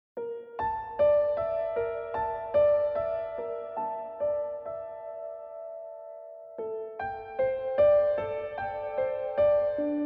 piano only; anime; sword art online ost

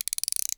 wrench, repair; gmod; svmod

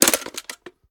reload empty drum drum out; reloads; gmod

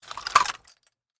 wpn gbl plr inspect settle; viper

